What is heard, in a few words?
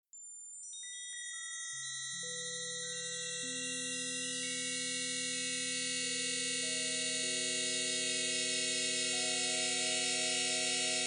random sines